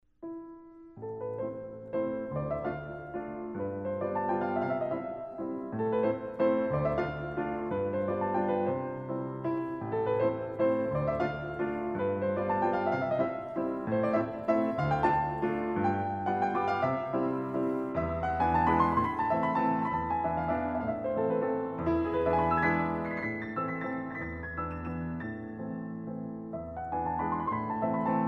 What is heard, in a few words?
Waltz